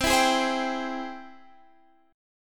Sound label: Ab Augmented Major 7th C, guitar